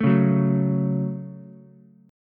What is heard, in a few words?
F sharp Minor, Ukulele; strummed; 2,1,2,0